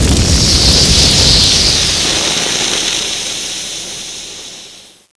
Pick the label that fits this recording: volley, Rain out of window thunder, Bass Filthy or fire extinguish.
fire extinguish